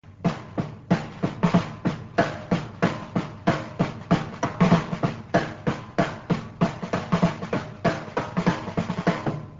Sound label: rhythm